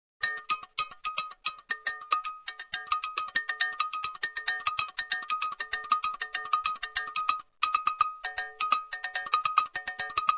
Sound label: AGOGO